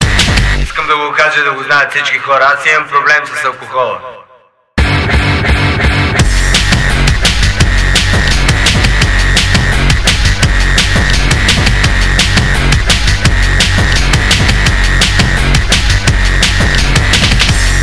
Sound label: Dj Darkstep